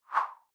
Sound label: whoosh simple slash big high; swooshes-swishes